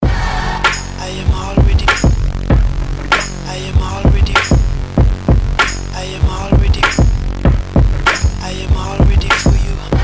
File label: Best Beat